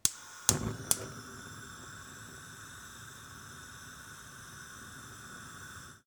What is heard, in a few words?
Gas Cooker Light Up